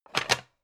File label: pan stack hard